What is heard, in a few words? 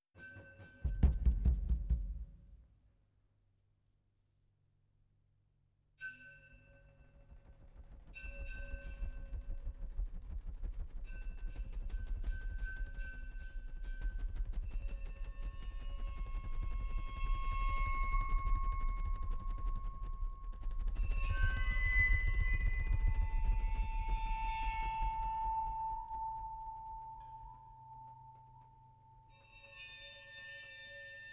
Piano, Waterphone, Chinese Gong